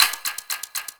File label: hack minigame UI time tick; Warframe; Duviri